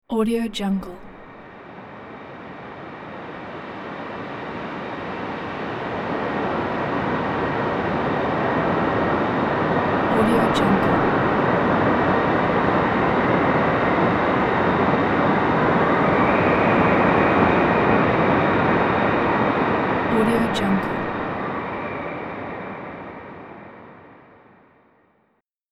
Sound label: future wind; sonic-boom